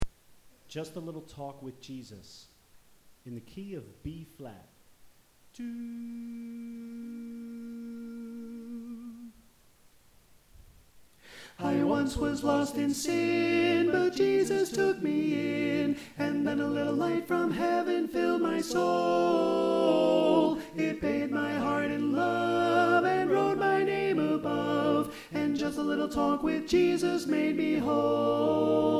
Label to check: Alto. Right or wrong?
Right